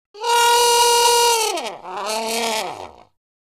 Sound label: Cartoony Horn Wahwah, boings